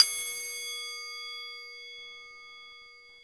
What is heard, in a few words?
soft hitfinish